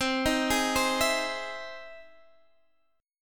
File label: E Augmented Major 7th C